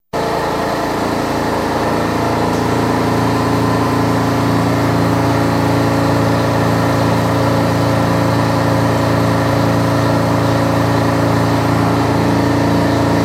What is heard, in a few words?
Boat Exhaust Loud Ambience